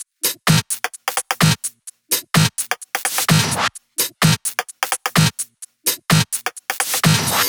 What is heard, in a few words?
128BPM Kit; Vengeance Samples; VFH1 128BPM Southern Kit